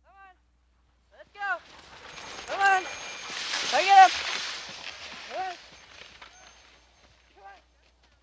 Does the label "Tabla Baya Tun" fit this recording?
No